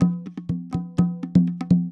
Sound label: PERCLOOP ETHNO 125; eJay